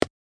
snowball brick; UNUSUAL KITS and FX

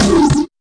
fx drum, Electro FX

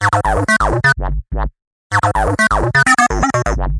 acid whup whup melody; SYNTH AND ELECTRONIC LOOPS

